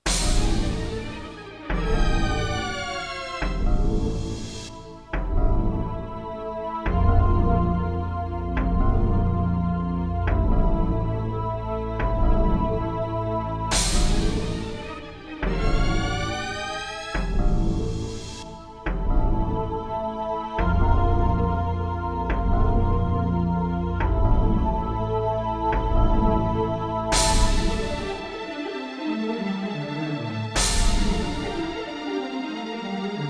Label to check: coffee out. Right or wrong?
Wrong